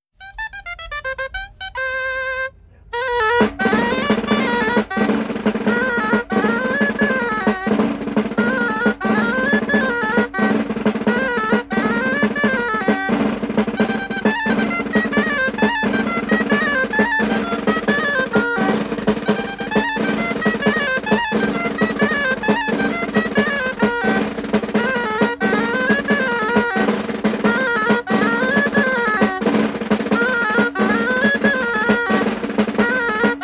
Baile para la procesion

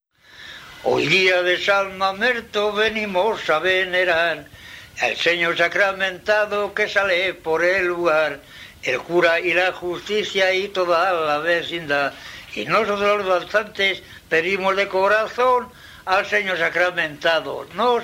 Paloteos, Bailes; Ceinos de Campos; Valladolid

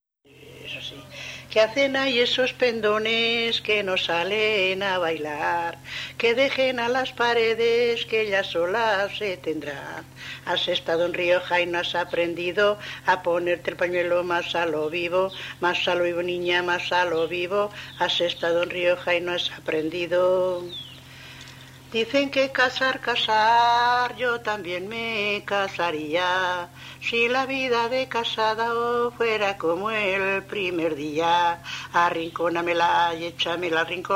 Jota, Tiedra

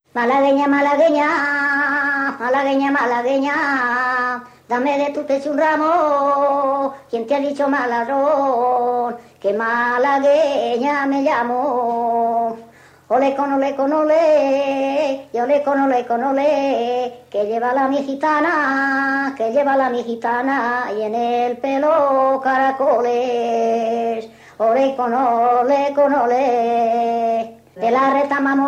Malaguena jota Mojados

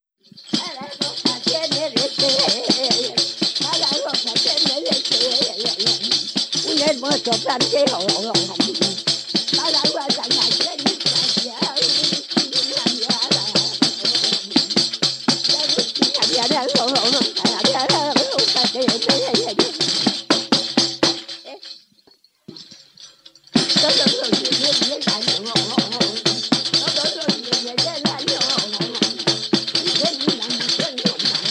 Jotas; Jotas de Nava del Rey

